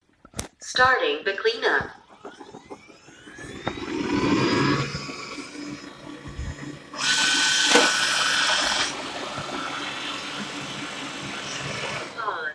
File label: Mi Robot